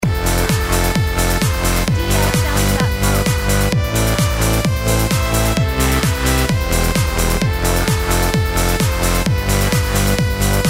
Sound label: Acid 130